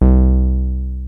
SOFT SQU 303, ROLAND TB-303 BASS; Rubber Chicken Software Sample Bank

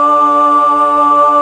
TRON FEMAL, Vintage Instrmt; E-MU Formula 4000 Series Vol. 5; Protozoa

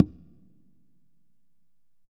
Congas wet, drums percussion

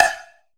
FX BLOCK; AKAI S-Series CD-ROM Sound Library VOL-5; FX PERC

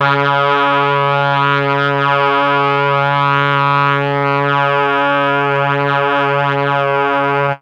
MEL BRASS; ILIO; Propeller Island; Legendary M400

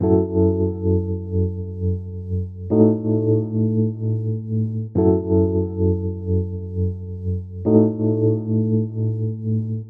Rhodes, Cinematic Funk

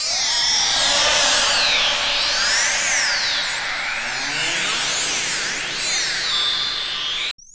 Long Decay, FX

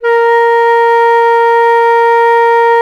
FLT, WOODWINDS; Roland - LA Composer vol. 1

